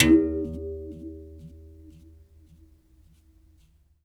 Tar, One Shots, World Percussion; Sony CS

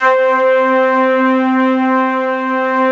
ST ORG, STRINGORGAN, Yamaha VP-1

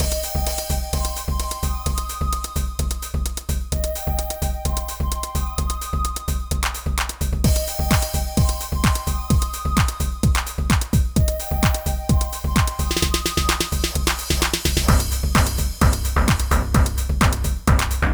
Old Skool House, 129 bpm; Big Fish Audio; Construction Kits; IntaDance Demo

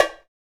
PRC Bongos; Spectrasonics; Supreme Beats; World Dance

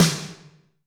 Snr Studio, Alesis D4

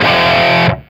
F#3 SOLDANO ROCK, Guitars

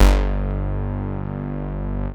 NOOG BASS G1; Best Service Dream Experience

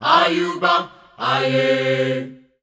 F 100, Voices Of Africa Sung Phrases

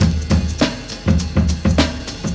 102 BPM

